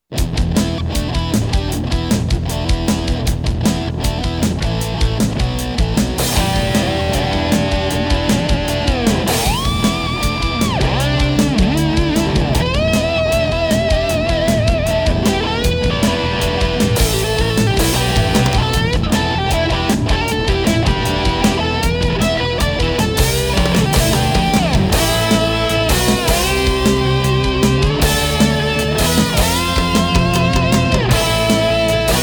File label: hard rock